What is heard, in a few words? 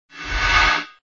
Sound SFX, flipper